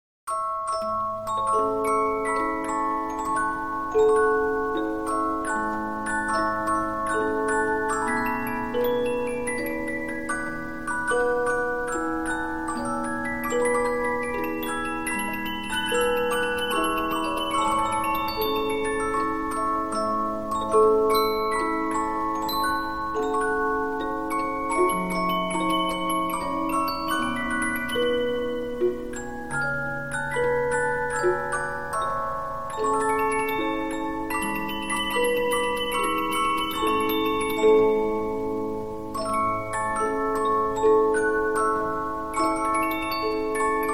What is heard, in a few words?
Polyphon, 15inch disc musicbox